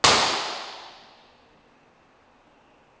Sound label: Bathroom ImpResp